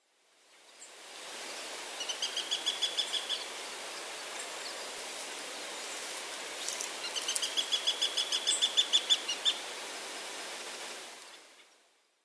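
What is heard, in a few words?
Eurasian Sparrowhawk, Sakata; koe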